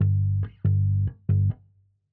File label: bass note G; Calypso